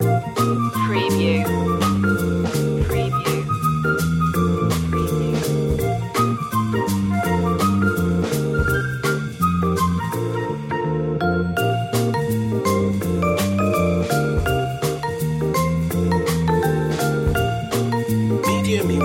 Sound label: scooter ride loop